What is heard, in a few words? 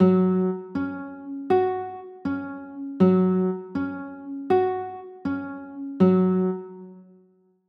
f sharp 5, chords